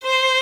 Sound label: violin